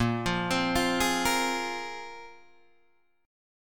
A sharp 7th Suspended 2nd down Guitar DADGAD

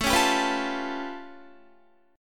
A Augmented 9th A, Guitar; A+9 strummed

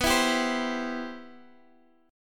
C Major B

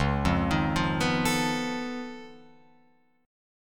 Db Augmented 9th Db down Guitar Open F; arpeggiated; JGuitar

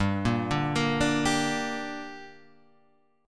G Minor G, chord; Gm Chord {3 1 0 3 3 3} arpeggiated

